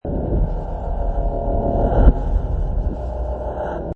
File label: Loop core 1: Sci-fi, core or reactor room, metallic rotations, pulsating; pulsating force fields, charged atmosphere